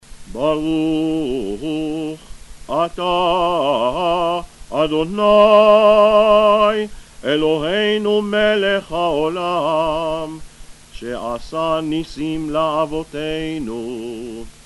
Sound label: blessing before megillat esther chanted